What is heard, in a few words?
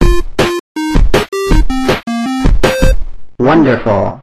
victory